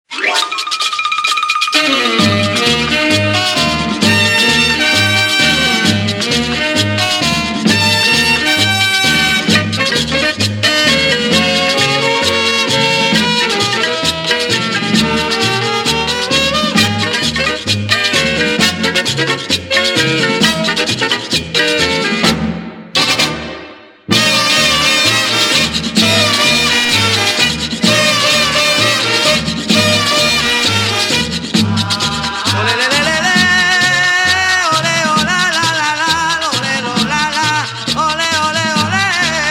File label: merengue flamenco; 1956